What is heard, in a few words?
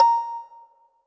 Kalimba A#4